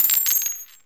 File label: SE GAME BREAK; maimai